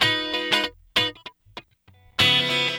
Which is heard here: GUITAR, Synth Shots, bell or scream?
GUITAR